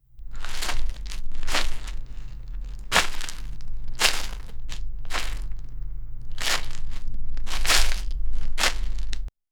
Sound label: sound of footsteps with a